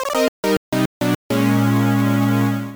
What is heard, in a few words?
Minigame Fanfare; Nintendo - Kirby Squeak Squad; Video Game OSTs